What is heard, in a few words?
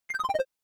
Timer Ends Time Up